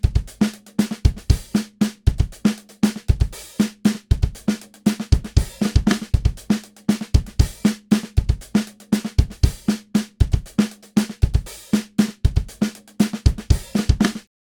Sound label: Drum loop wet